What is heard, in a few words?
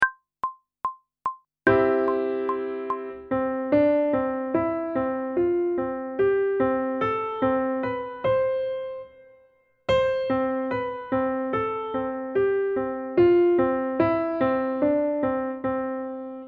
Intervals of a Major Scale one octave ascending and descending; pop vocals; grade 8; Technical Work